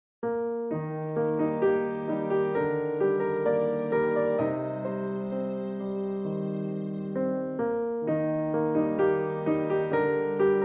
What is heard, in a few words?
Piano Improvisation